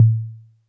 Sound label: Toms, Elektron MachineDrum SPS1 MKII